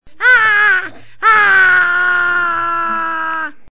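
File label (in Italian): bimbo che piange, sono due suoni. ce n'è uno lungo e quello prima che è più corto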